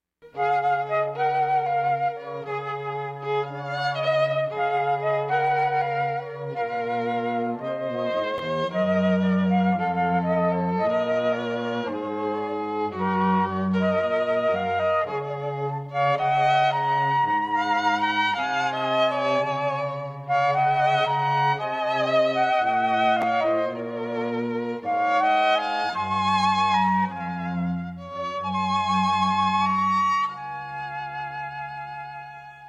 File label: Fl Trio